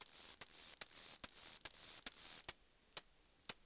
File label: CICADA; zones nature; PC Gamer IT CD 40